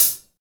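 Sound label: hi hat; Best Service Gigapack 2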